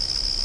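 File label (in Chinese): 蟋蟀; 红色警戒2/环境音效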